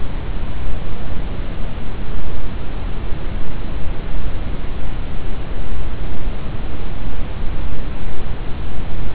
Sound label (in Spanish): ruido marron